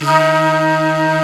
Flute, Singin' Flute

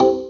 orgharm lo; Sonic Foundry (Sony Creative Software); Synchro Funk; One Shot Sonics

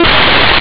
piano strings; ST-XX Amiga Soundtracker Sample Packs

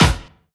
KIT, Dance Drums; Best Service

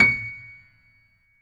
PIANO C#, STEINWAY; Greytsounds - Philip Wolfe David Crozier's Saxophones; GR8 STEINWAY

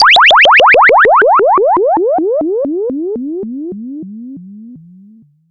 FX, Moog Minimoog

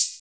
HI FREQ HH, Drums; Distored Reality 1&2

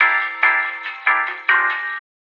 Mambo; Old Lounge Recordz Mixes

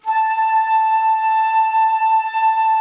C.FLUTE A 5, cross flute

